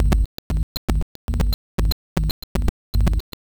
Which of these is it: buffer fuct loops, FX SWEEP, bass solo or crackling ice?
buffer fuct loops